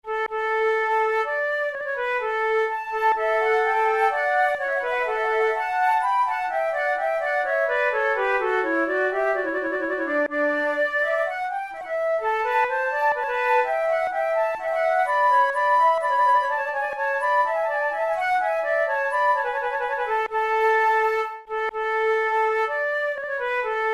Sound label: courante